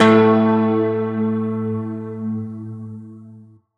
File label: tonal oneshot A; Pop; Slate; PASADENA Pop Sample Pack; Tonal One Shots